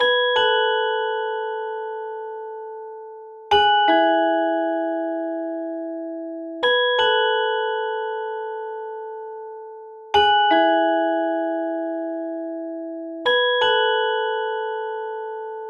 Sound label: Eggshell, ringtones, HTC One M7